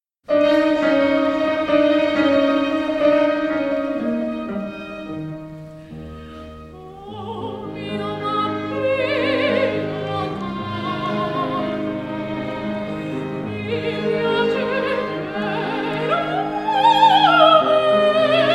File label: soprano recital; Liriche / Niccolò van Westerhout